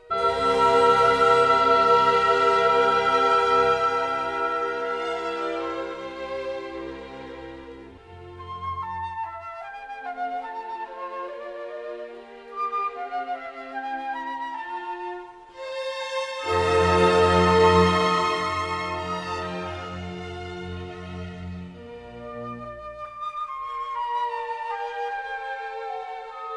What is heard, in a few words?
Sinfonia in E flat, Larghetto; Karl Richter; C.P.E. Bach; Deutsche Grammophon